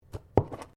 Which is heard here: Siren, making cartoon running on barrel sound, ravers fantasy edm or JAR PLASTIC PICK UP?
JAR PLASTIC PICK UP